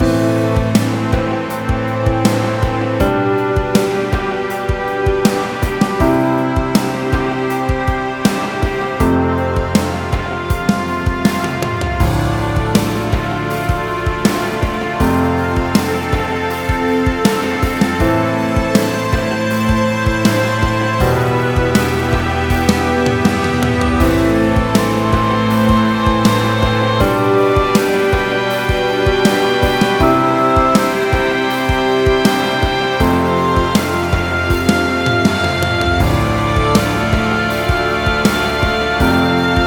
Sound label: dramatic drum comp huzun; by burak sound bank; dramatic dram vol 16